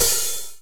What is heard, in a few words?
soft hit finish; osu!